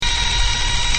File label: Zoom; bruitage; Electro-menager